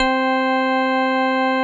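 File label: METAL ORGAN; Greytsounds; Scott Peers Sound Engineering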